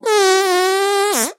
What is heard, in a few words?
fart